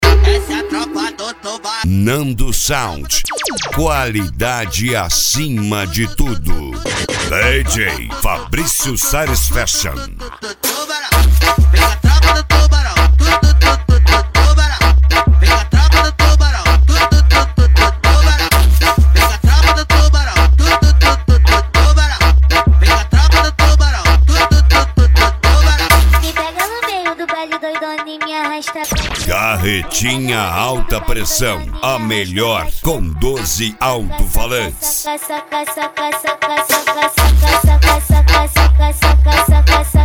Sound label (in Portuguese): ritimada agressiva